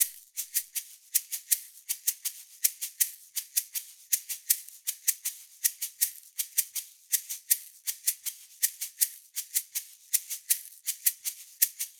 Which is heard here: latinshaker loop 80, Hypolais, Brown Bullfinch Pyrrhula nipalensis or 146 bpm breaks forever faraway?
latinshaker loop 80